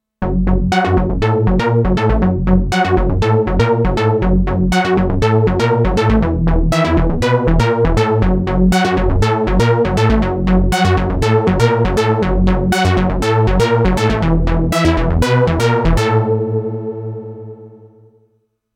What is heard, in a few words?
Retro Bass, korg; dense